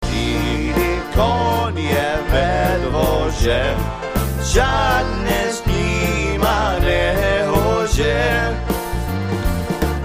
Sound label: Waltz